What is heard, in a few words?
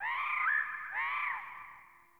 Birds Low, Ambience Plains Landscape; Warframe; Eidolon Plains